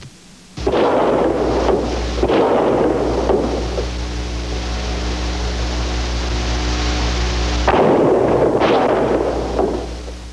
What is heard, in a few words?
4 Shots; jfk Assassination